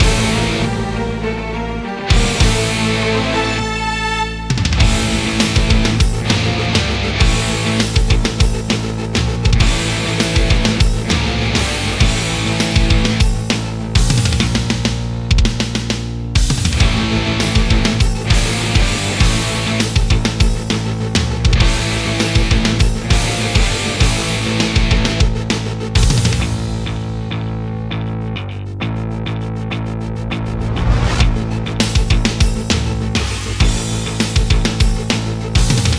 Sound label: COMBAT THEME